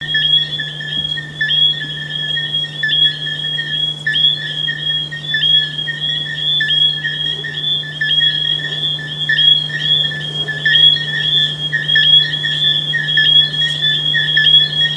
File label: frogs